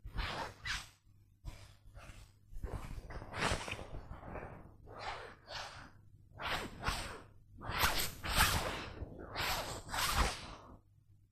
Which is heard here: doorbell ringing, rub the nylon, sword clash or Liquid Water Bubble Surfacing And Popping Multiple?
rub the nylon